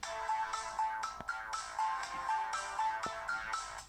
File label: beat plucker, ringtones